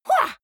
goblin fight shout attack short, comedy cartoon vocals; soundpool